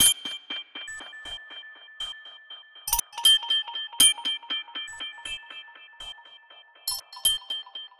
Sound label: Color Xylophone Loop, Ethnic Instruments; Sonic Foundry (Sony Creative Software); The Electronic Music Manuscript Collection